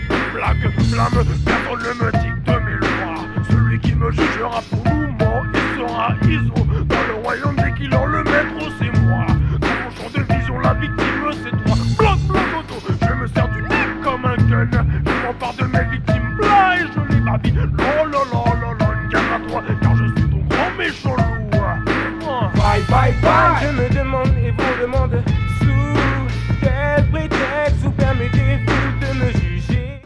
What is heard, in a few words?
Hop Jazz